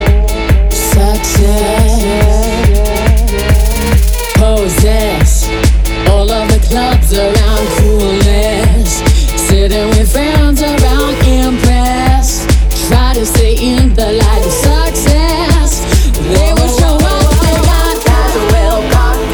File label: Hypertecho Mix